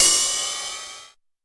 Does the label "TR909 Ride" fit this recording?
Yes